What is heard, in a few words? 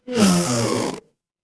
cartoon squeak